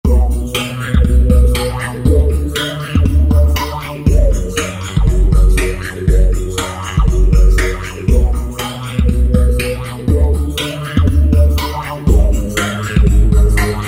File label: Groovy-Doo !, Roland V-Synth